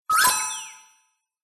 science fiction cyberpunk robot or equipment beep

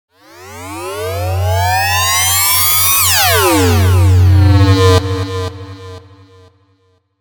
sound design metalic fm rise

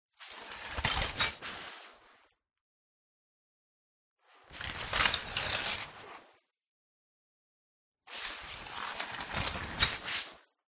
Shower Curtain Slide; PMSFX

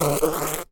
insect fly buzz in glass; animal

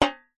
impacts metal tray hit muted hard

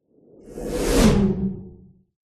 magic spell whoosh powerful energy pass electric

